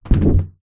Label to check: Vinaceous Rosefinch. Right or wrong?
Wrong